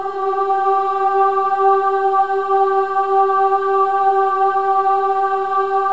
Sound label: WOMEN AHS G4; Spectrasonics; Symphony of Voices